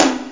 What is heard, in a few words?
tom; u110